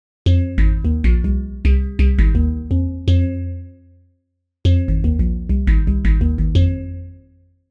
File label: Bowl Short Release; Milko Bowl